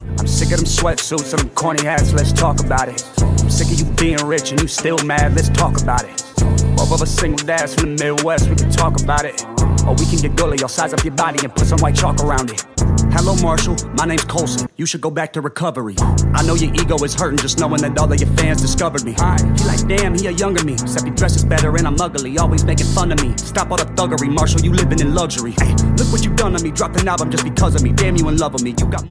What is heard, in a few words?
Rap